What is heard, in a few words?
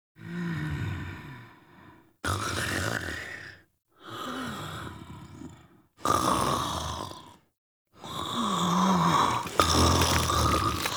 Snoring; Deimos; Mother Intro; Cinematics